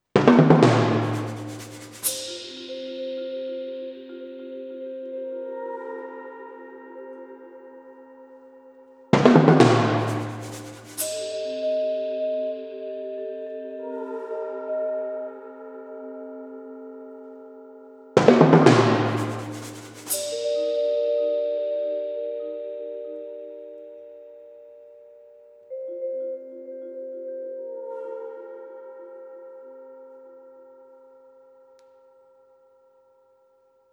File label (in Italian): Percussioni